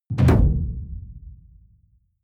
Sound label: sci-fi underwater impact; hits impacts